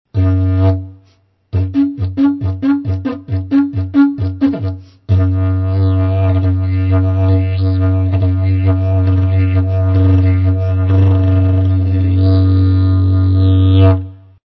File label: didj